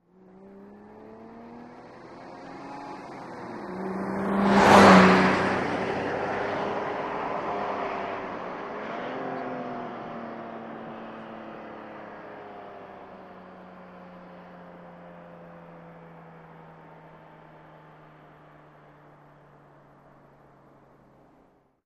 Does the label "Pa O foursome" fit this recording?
No